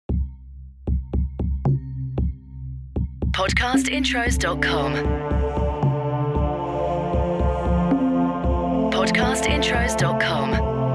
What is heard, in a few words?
Podcast Watermark WORLD Pulse